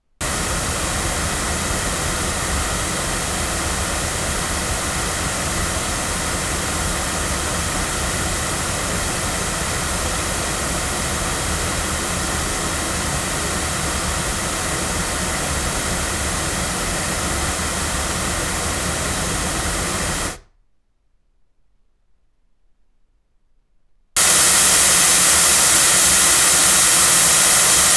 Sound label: loudspeakers; Lauten Oceanus; cardioid; microphones